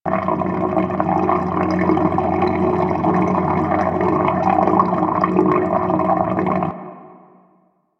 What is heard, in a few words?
Pipe Gurgle Loop; Ambience; Warframe; Grineer Galleon